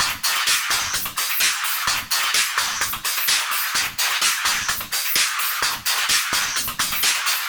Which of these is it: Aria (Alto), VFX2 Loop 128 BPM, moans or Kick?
VFX2 Loop 128 BPM